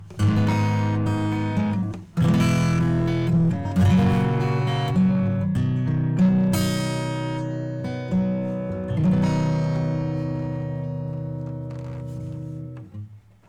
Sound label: acoustic; All Hot Iron Clarity; Kelvin